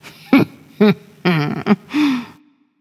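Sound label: heh heh, female; smite; voicepack; hera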